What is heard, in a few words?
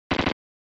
Shooter, Shoot; SNES